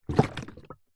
industrial power steering fluid in plastic bottle short shake movement